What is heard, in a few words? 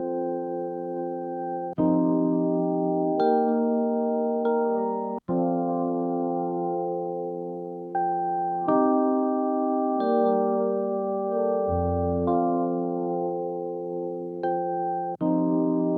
night sky after the rain, lo-fi; TinyBGM